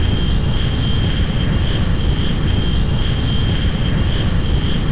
afterburner, aircraft engines

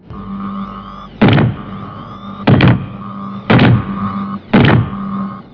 robot walk, scifi

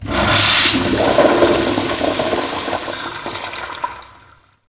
toilet flush

